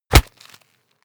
punch low smack hit

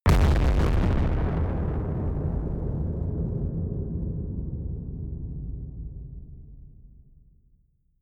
distorted explosions stereo

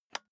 office chair lever

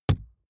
wood impact hollow large soft

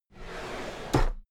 drawer cabinet equipment open regular

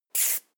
marker line medium

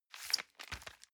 envelope swap squeak

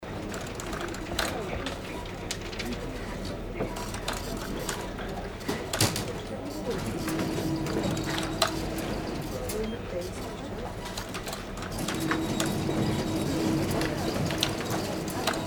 copy machine

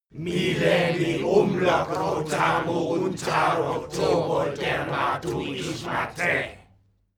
male crowd evoke

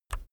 keyboard external key release soft

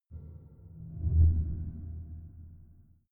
ringing impacts

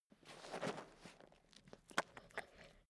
stafford eat sniff

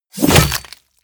flesh axe two-handed hit

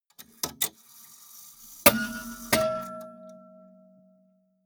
clock strike

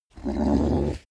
horse pony nicker

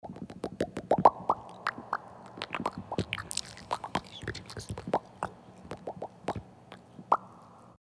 bloubs

